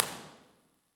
Lecture Hall, Impulse Response; Warren; EchoThief Impulse Response Library

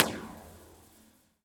Aqueduct; River Mountains Loop Trail; Impulse Response; EchoThief Impulse Response Library

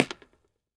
Convention Center Steps, Impulse Response; EchoThief Impulse Response Library

